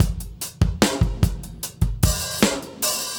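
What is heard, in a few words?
75 bpm, beat loops; Zero-G; Monster Beats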